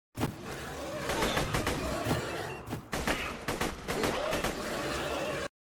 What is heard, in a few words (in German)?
waffe; borderlands 2